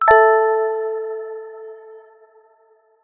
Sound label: water drop message ringtone sms